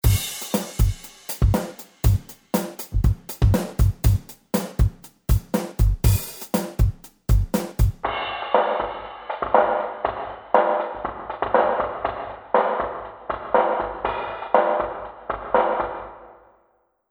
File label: air springverb